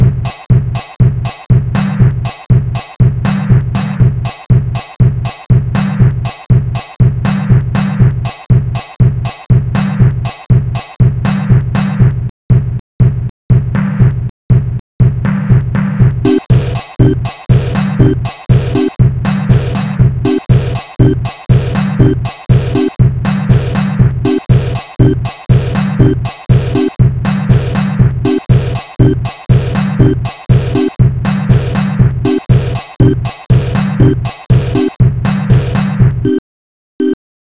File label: sinewave